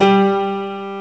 PIANO, HOUSE

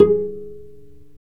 STR VC PIZ, Chamber Pizz, String Master Series; Roland - String Master Series

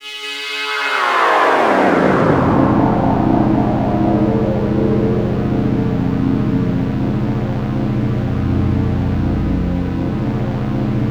suspense, special FX twisted; USB Soundscan; AKAI

